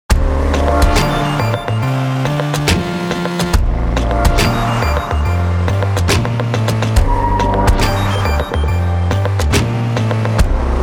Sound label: Through The Gears; MINI FS